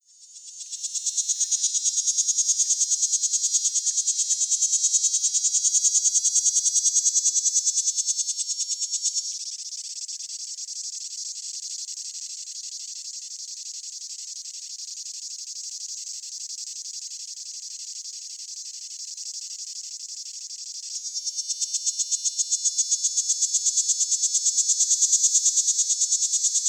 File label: Hadoa parallela, cicadas songs; US; AZ; Hadoa parallela (Davis, 1923)